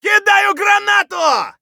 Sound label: grenade; Escape from Tarkov